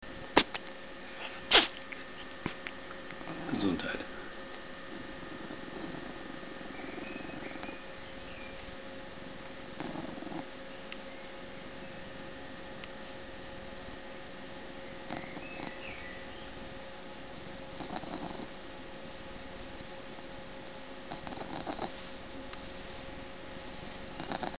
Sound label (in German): katers schnurren